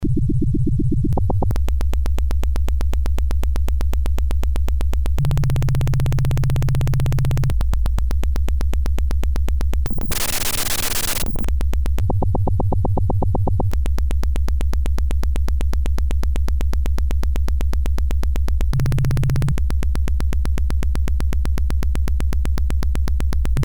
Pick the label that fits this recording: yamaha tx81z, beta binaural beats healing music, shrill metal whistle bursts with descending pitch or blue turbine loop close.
yamaha tx81z